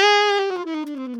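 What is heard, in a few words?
ALTO long fall G#3, Saxophones; Greytsounds - Philip Wolfe David Crozier's Saxophones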